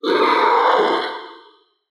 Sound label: raptor roar medium; soundscrate